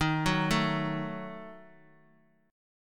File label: D sharp Diminished D sharp; arpeggiated; D#dim; x,3,2,0; JGuitar